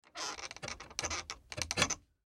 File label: Hammer Pull Nail, Hammer Pull Nail From Wood; Hammers (Sound Effects:Tools); Blastwave